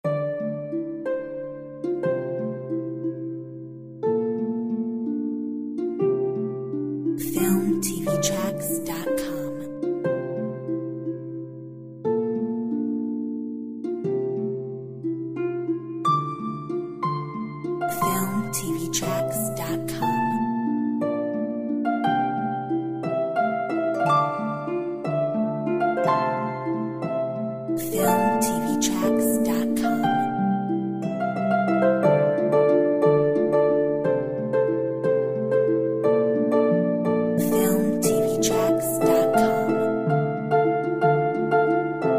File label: contemplating Harp